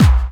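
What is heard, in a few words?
snare drum bass drum, Dance Mega Drums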